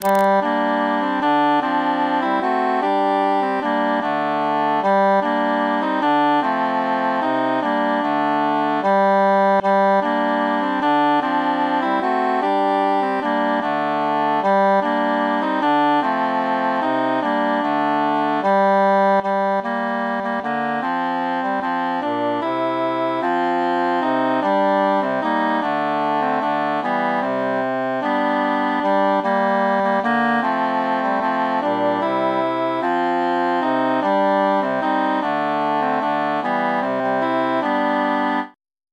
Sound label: three country dances tenor mute